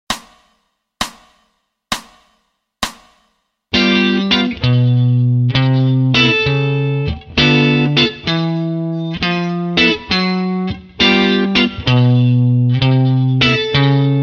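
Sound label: Walk Bass Lines slow; walking bassline lesson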